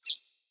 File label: Bird Single Chirp; Pothia Bird Guide